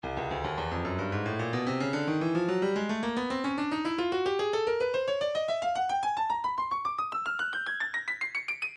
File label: breakbeat midi, 110 bpm; wall of breaks a; Stylus Breakbeat Midi Files